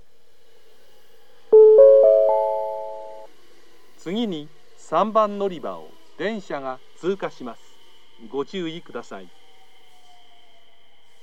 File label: pass, station; suita